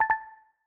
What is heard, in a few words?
Text Message Acknowledgement Exclamation; macOS system sounds